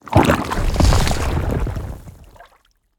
creature texture water movement large animal, Cave Creature Sound Effects; Bluezone